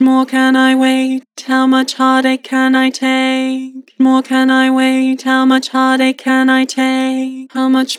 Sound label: Vocals, Flat, How much more can I wait; Harmor Vocals